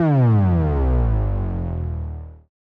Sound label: Bass Drop; SoundsCrate